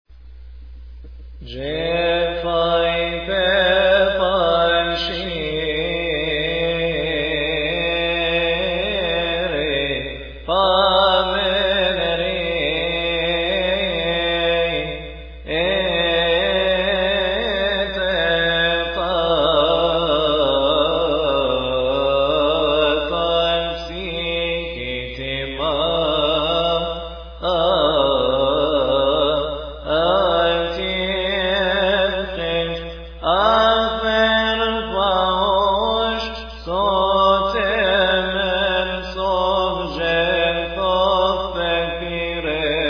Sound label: cymbals verses